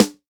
smooth bottom end steel snare drum sound g sharp key